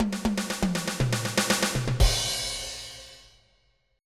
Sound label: fill